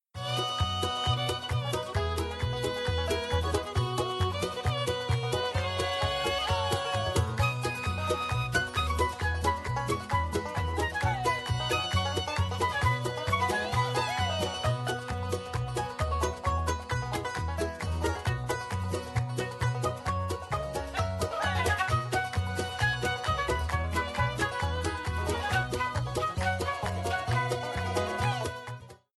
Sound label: BGM Free Spin, SLOTS; Thanksgiving Blast